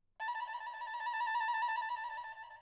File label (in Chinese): 扬剧主胡（小西皮） 演奏技法 打音